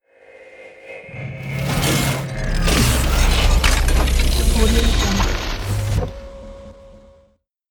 robotic transform

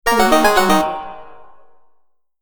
manga notification; sonic-boom

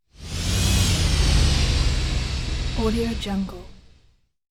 stealth boat

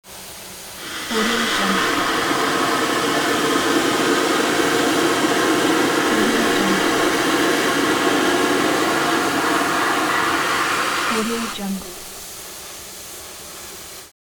steam boat whoosh

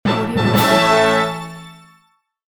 victory fanfare

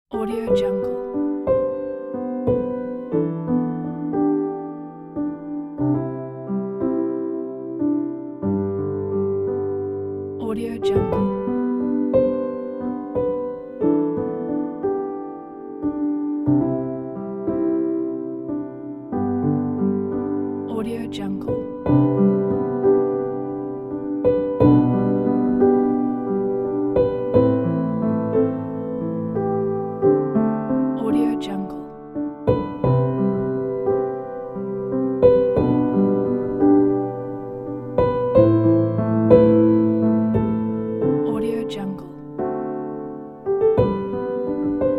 piano soft music

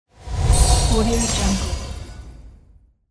magical spell sound; infinity sfx productions

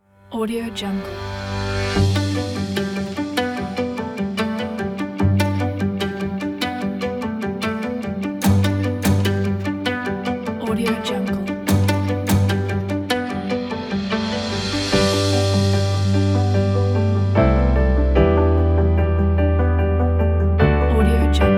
happy corporate

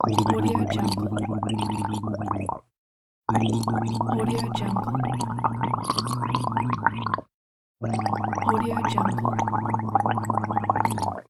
gargling

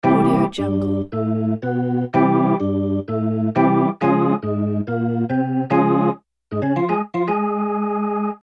rousing arena organ chant; sound ideas

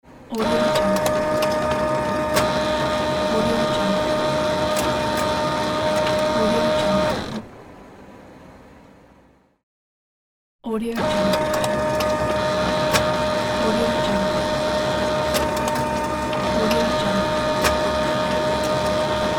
photocopy machine sounds

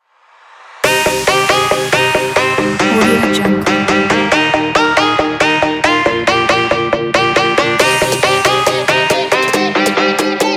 happy trap travel vlog

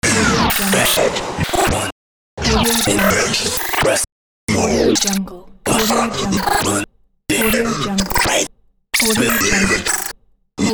glitch pack; karatturmenko

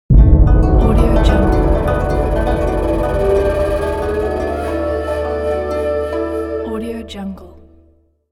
mystic oriental logo

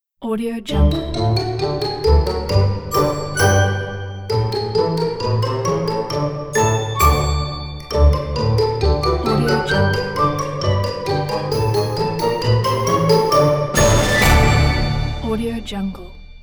sneaky comedy ident

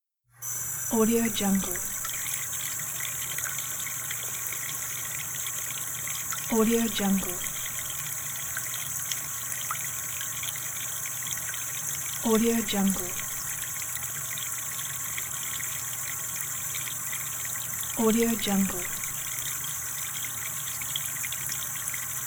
bathroom faucet

